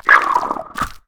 mantoid die; Fate The Cursed King; MONSTERS

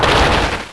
break; Monster girl quest Part1,2,3; Visual Novels

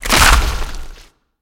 boulder impact; Fate The Cursed King; SPELLS

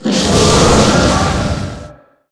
fire beam, basilisk; Fate Undiscovered Realms; MONSTERS

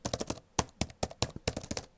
stone; Monster girl quest Part1,2,3; Visual Novels

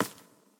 footsteps hewn; Fate The Cursed King

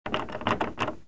door; Monster girl quest Part1,2,3; Visual Novels

